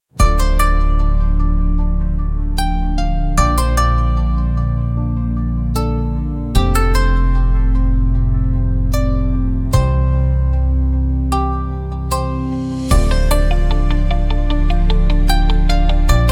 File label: Moonlit Koto, quiet japanese ambient